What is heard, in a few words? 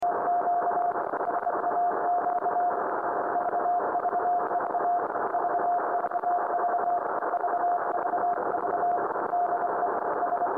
SAQ extra transmission; Receiption of SAQ on Sunday